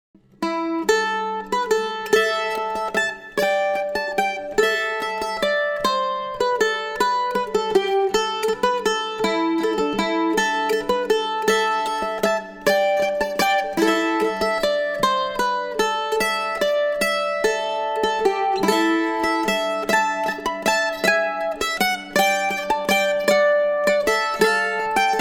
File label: mandolin